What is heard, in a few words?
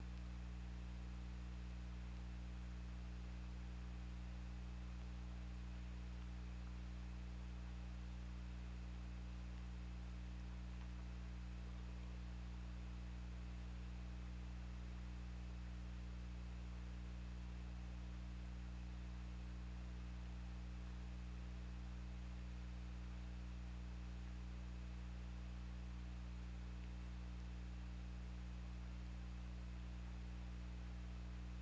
Orca; OrcaLab